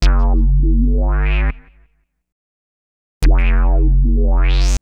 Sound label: Swoop Bass, Drum N Bass